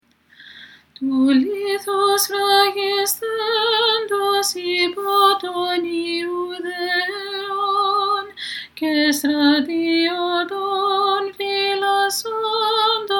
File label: Apolitikion Tone 1 Greek; Tou Lithou